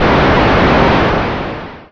ship hit; invinco

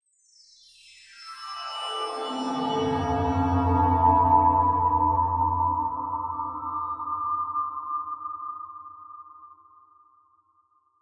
chime verb bright into dark bend; Atmospherics

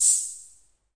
vox hh, Vox AC1 RhythmVOX; drum machines

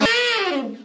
sax gliss down; Horns and Brass

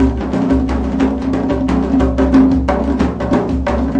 120 bpm, mideast complex, drum loops and breaks

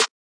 Snare, hip hop; style kits

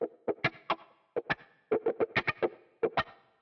140 guitar wokka; GUITAR LOOPS

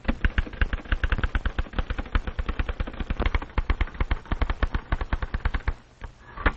typing sounds, Quirky Female Typing Instructor; VOCALS and SPOKEN WORD

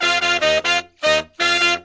cheesy horn section riff; Horns and Brass

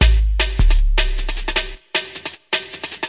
154 jungle intermission; 141 to 160 bpm

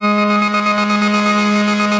Synthesizer Middle C Notes, Lead; instruments multisampled; synth keys

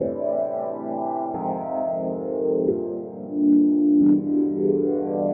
mellow minor rhodes chords; SYNTH AND ELECTRONIC LOOPS

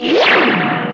Electro FX; Ueb HE Electro FX

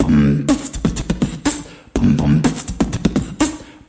beatbox, drum loops and breaks

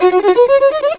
fiddle riff

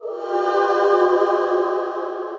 Layered Vox, female layered synth vox; VOCAL

